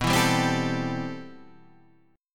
C Major 13th B

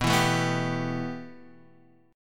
B 7th Suspended 4th Sharp 5th, chord; B7sus4#5; strummed; Standard; JGuitar

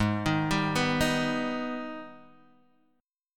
Ab Minor Major 7th, chord; arpeggiated; JGuitar

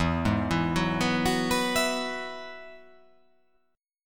E Major Add 9th

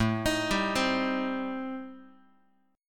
A-Minor 7th Flat 5th, Guitar, chord

